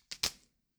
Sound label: three card flip